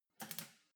weld sizzle very short

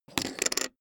poker chip stack small fall down wobble

